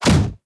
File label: Hit; blue archive